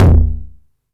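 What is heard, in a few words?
909 mid tom tape saturation; Toms; Goldbaby Tape808 & Tape909; The Tape 909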